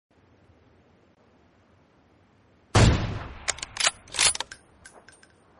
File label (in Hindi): goli ki aawaj, awm gun